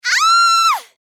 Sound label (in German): schrei weiblich